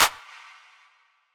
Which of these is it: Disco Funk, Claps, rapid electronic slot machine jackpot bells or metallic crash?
Claps